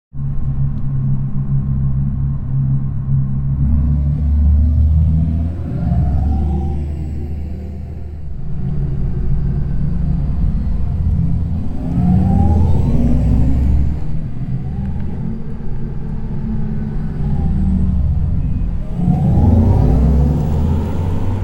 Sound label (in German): eSound Vorbeifahrten